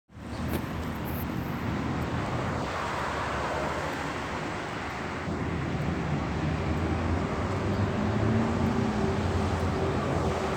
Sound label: Tehran Street